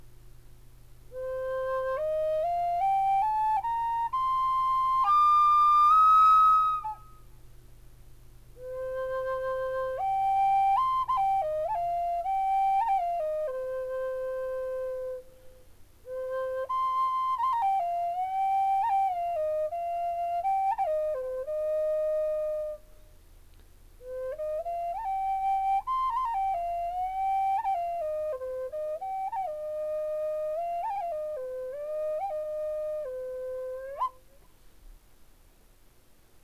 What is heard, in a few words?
new cedar middle c, flutes